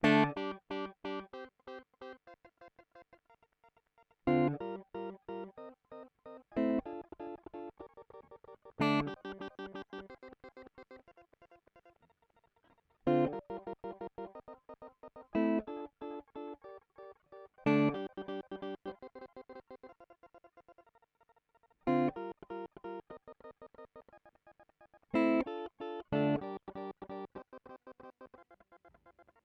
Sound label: Meris Polymoon Early Modulation